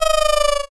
goat

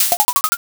board flip

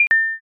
pos

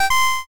judge good

